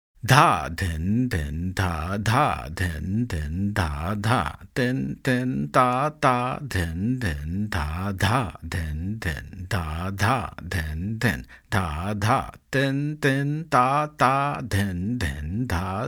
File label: T T Madhya; Madhyalay Tintal Theka; Madhyalay Tintal Theka Variations